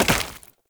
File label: Step Flesh, Foley; Warframe; Oraxia